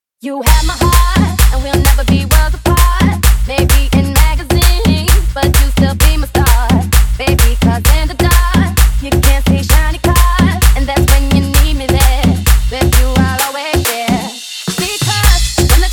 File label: intro outro 130 bpm